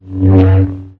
swing long; sinfar haks

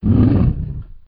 hit; sinfar haks; sf effects

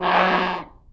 wram hit; sinfar haks; sf effects

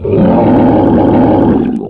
hit; sinfar haks; sf effects

